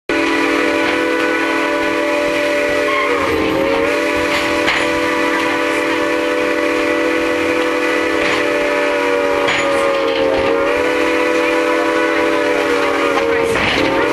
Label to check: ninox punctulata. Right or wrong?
Wrong